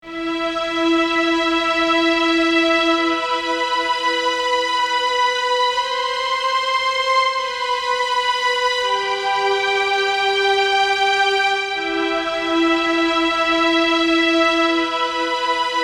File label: Main Strings wet